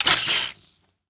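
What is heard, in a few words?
Ui Button Forward; Angry Birds Star Wars 2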